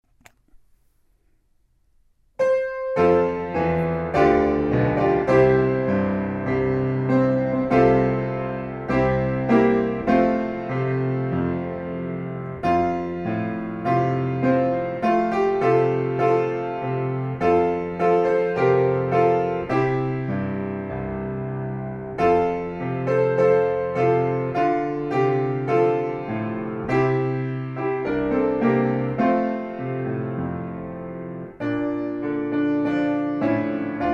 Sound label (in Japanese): piano nomi; noguchisho kouka